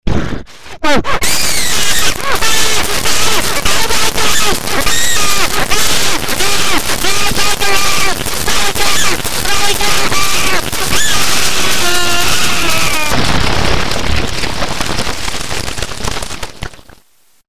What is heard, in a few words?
screams from hell